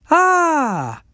Emote Male Happy

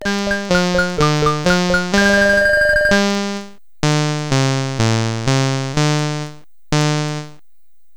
Game Over (SID Stereo)

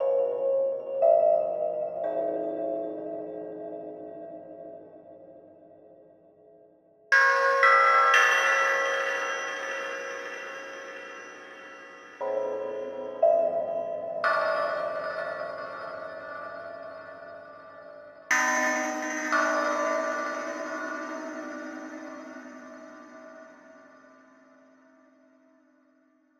Midgame Music; imscared

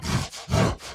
Enraged Breathing Loop; The Town; Burns

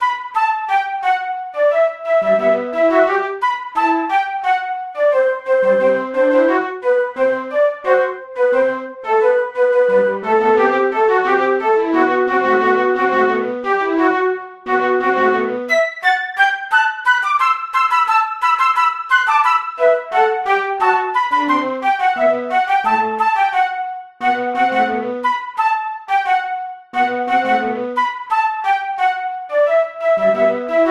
Flute

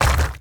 Pound; portal quest android; Mass Destruction Skill2

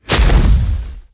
Electric Ball Hit; god awe full clicker 2018 gamerip

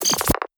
lever error

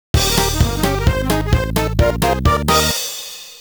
Minigame Clear; SpongeBob's Atlantis SquarePantis

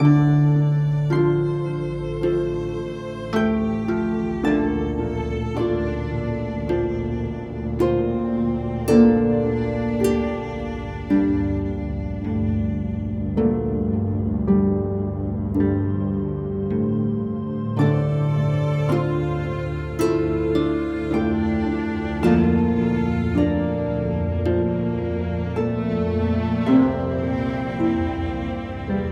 Loop Neutral; The Walking Dead Michonne 3 What We Deserve

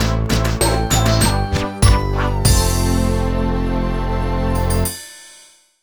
Jingle LOSE BPM98; super scribblenauts; nds

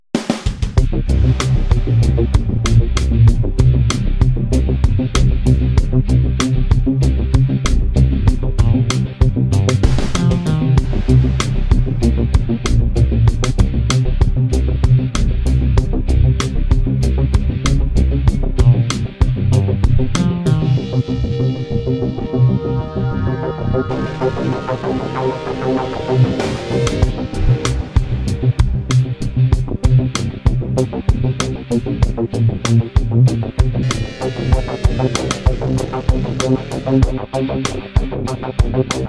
Drive Music; bridge constructor portal; android

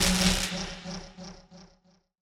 Skill End Magic

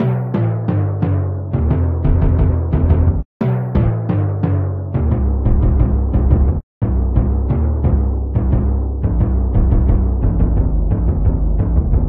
Drum

